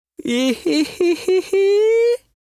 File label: Vo Male Ehehehe; gekiban